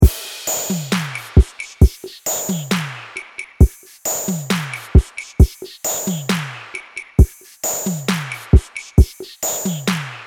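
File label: 67 bpm C minor BeachFront Drums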